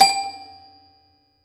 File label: hard G5; Ableton Foundation FreePacks; Foundation Glitch Toys & LoFi